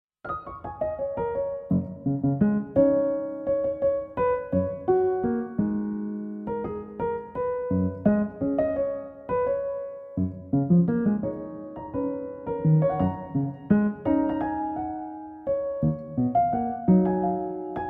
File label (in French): Quatuor; Blanc